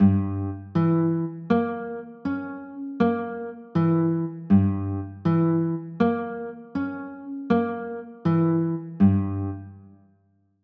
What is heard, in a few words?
c sharp dim7